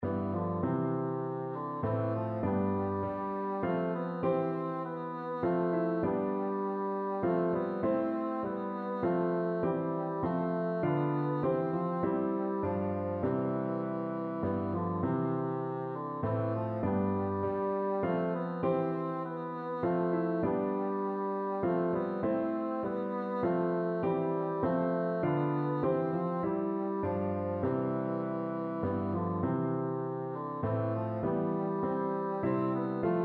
first noel, bassoon

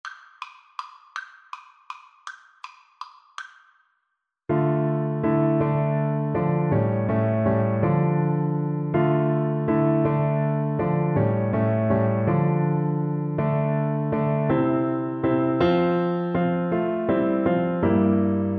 we three kings, Percussion

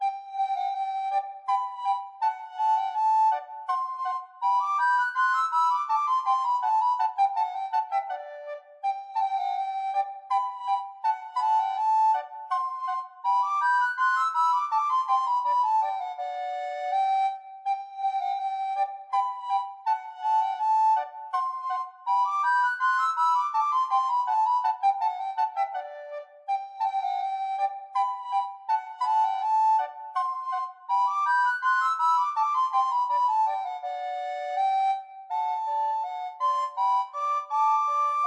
recorder

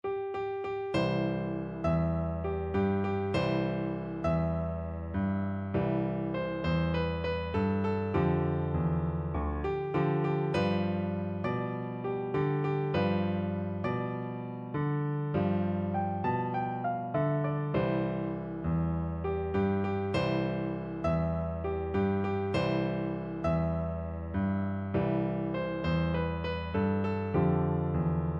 piano, piano duet

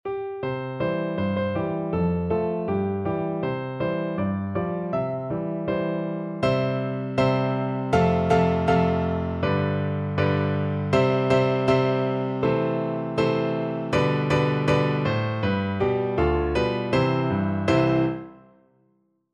Bingo, piano duet

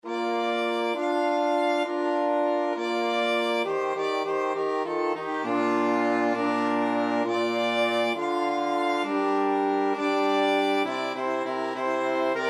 flexible mixed ensemble, 3 players

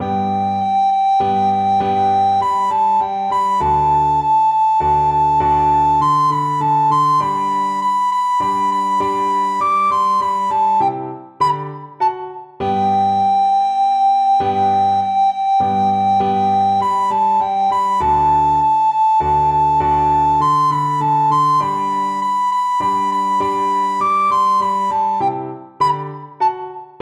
fanfare, recorder; corrette

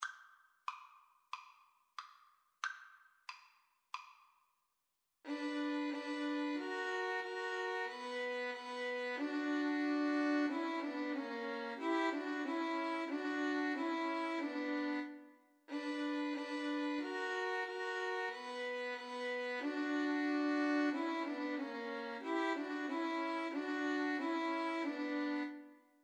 Violin Trio

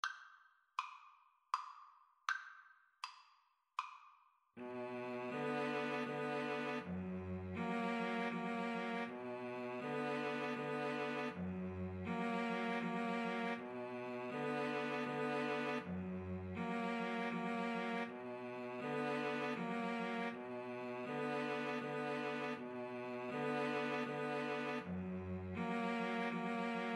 waltz violin cello; schubert; op 18 no 6

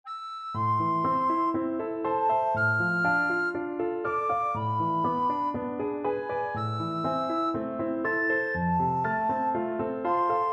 recorder

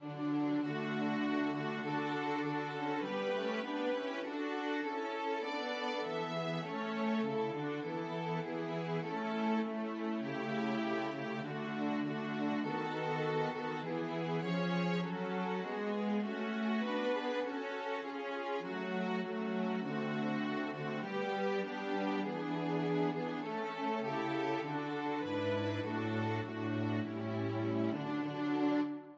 Navy Hymn STRINGS, string quartet

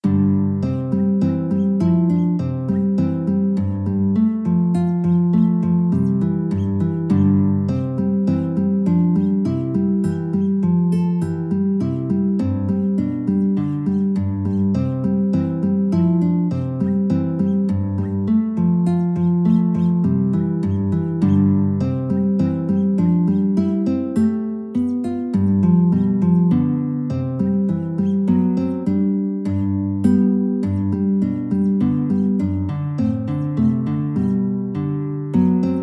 guitar

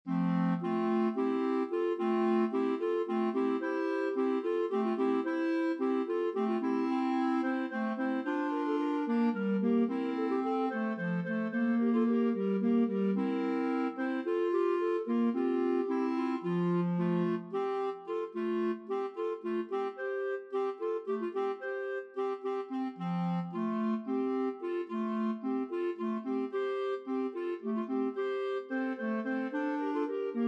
clarinet trio

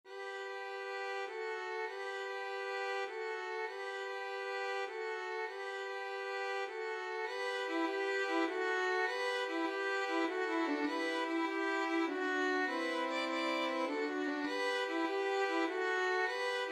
violin trio

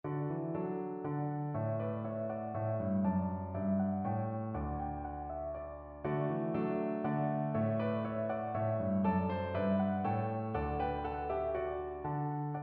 choir

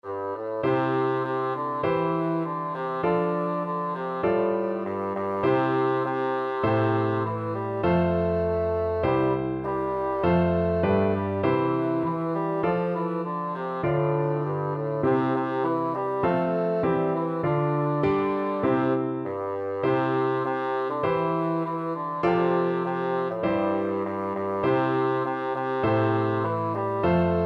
loch lomond, bassoon

